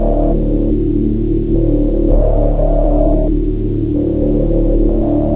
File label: lab drone, ambience